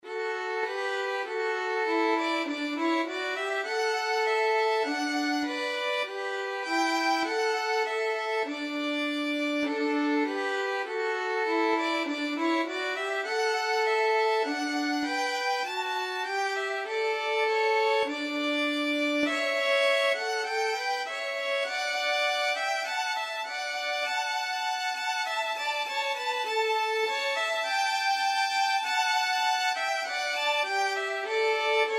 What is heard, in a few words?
cwm rhondda violin duet